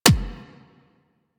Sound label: weapon punch pistol; Warframe; Weapons; Pistols